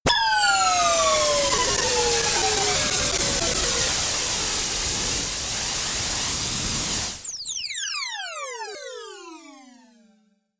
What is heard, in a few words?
noise jet fall